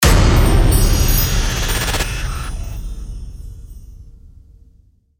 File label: hit and chimes